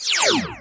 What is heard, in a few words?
lazer sfx